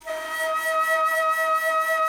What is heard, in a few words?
Alto Flute, Solo Instruments